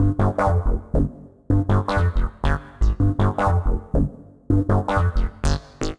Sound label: Bass Wave Selector F Minor 160 bpm, bassline; MIDI Clips; Tonal; Skitter and Step; Ableton Factory Packs